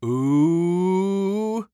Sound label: Human Male Ooh Your In Trouble Ooh